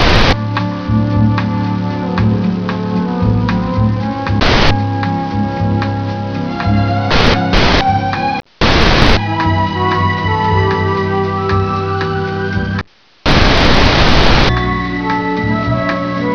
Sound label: crackle; opentdm